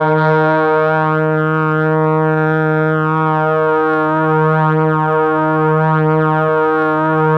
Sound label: BRS D, BRASS; Best Service ProSamples vol.55 - Retro Sampler; AKAI; BRASS+FLUTE